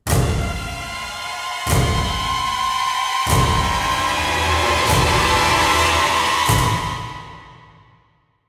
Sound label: Stinger Boss; Grom04